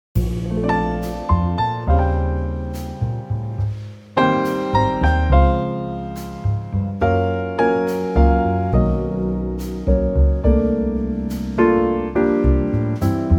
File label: F trio